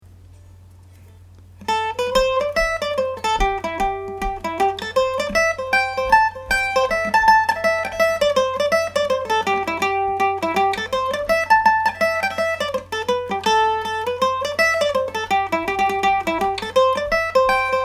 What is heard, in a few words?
chicago reel, Irish Mandolin